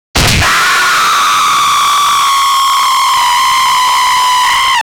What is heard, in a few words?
jump scare